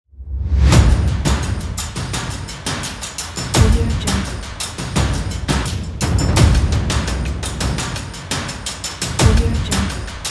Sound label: energetic drums beat; valentinlanskoy